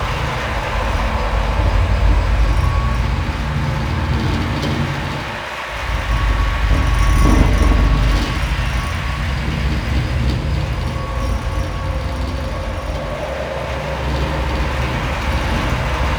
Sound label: Glass Terrarium Loop; Warframe; Ambience; Entrati; IntTerrarium